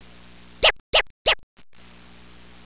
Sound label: rap